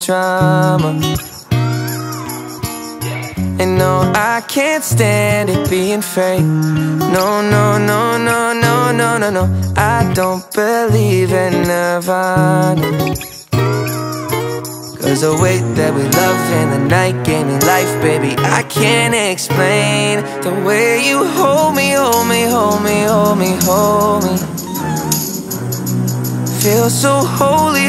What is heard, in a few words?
Acoustic